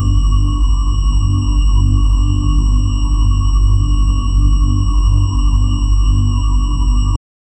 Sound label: spinning pulsar sci fi hi, high tech